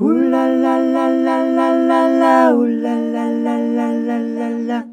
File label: HULALALA, males; Best Service; Hyper Dance